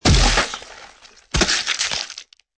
Tiếng cơ thể bị chém và máu chảy

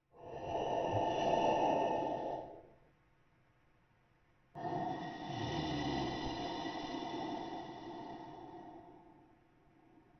tieng quai vat tho ngu ngay

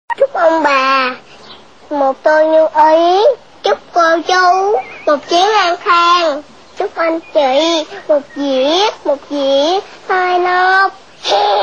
Nhạc chuông Tết

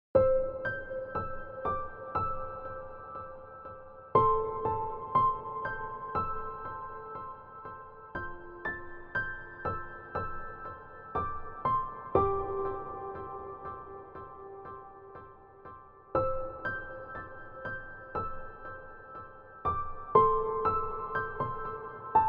Nhac nen giai dieu buon co don

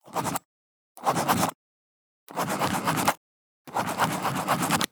dung but bi de ve len giay

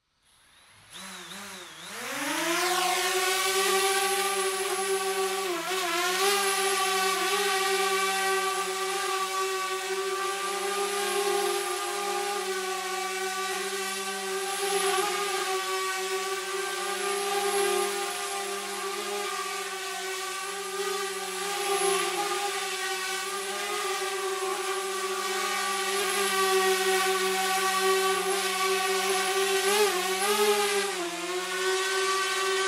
tiếng flycam drone bay

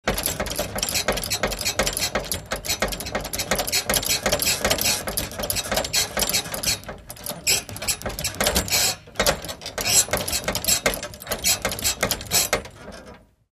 giuong cot ket giuong kim loai

